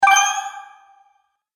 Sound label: Magic Purchase Delay Ding